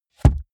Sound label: car bmw e46 interior sun visor shut soft